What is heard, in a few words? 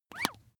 bath duck release